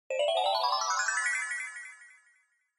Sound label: Scene Change Delay Slow Long